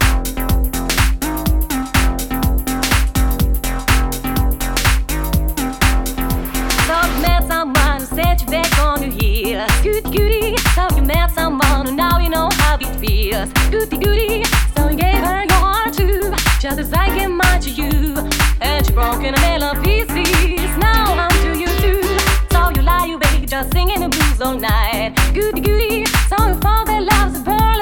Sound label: Electro Swing Megamix